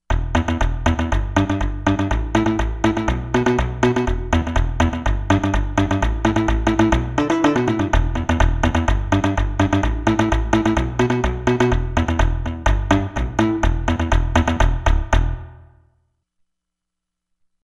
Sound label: EnvBass; Environment